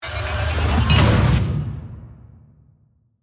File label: Mechanical Telemetry, Air, Pressure, Ascend, Metallic, Slam, Transforming, Robot, Production Element, Imaging Element, Accent, Transition; Spacecraft